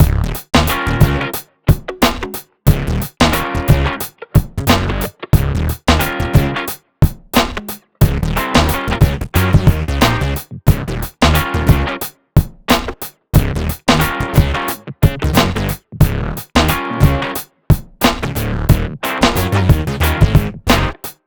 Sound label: Eb Loopable Funk; improvise